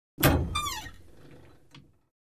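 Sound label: Gym Weight Equipment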